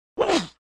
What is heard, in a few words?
target impact grunt